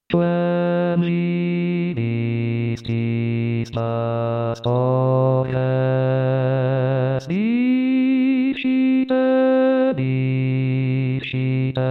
Bassi; Quem Vidistis Pastores; natale

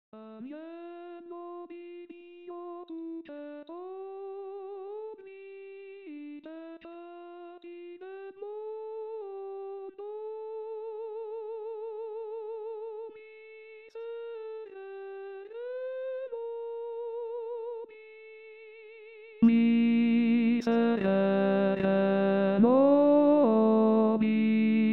Agnello di Dio Contralti

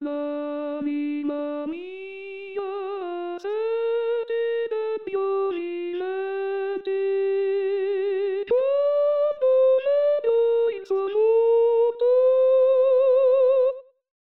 Coro; pasqua

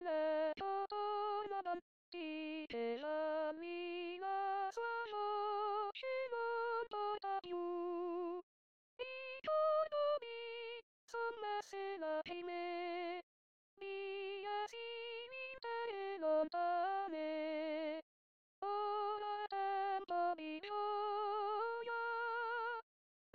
Contralti

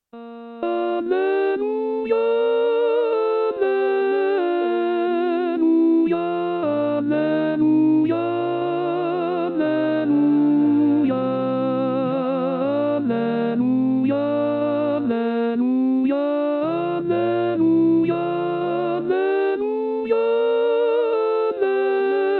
Alleluia contralti; Gabrieli; anno liturgico

